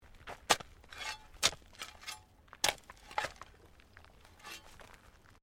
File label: Digging Shovel Mulch